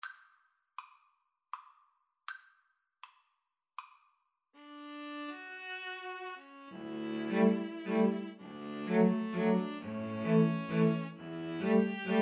waltz, String trio

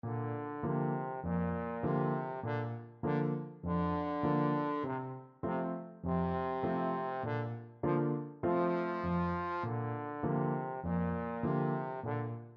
trombone

